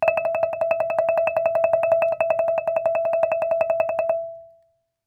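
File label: Marimba roll fortissimo E5; MIS Pitches - 2014; Percussion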